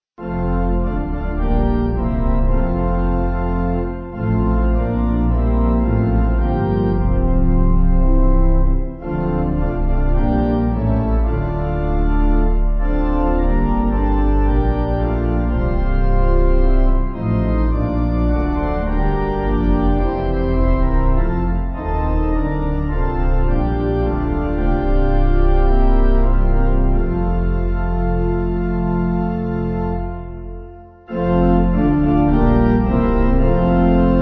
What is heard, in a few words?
Pipe